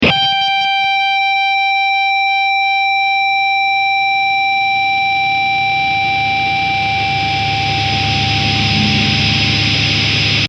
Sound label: feedback